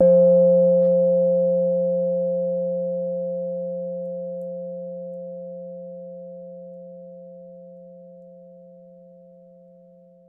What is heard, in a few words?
Ground Plate F3; Multisamples; Sound Objects; Ableton Live 12 Lite; Core Library